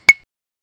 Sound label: object